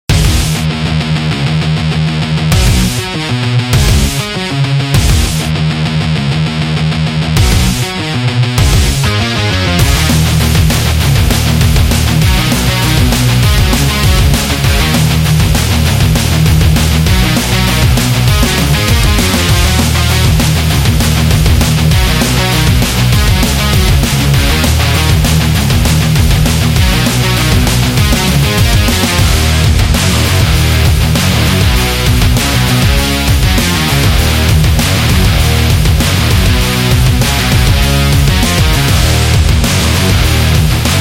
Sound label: Metal Rock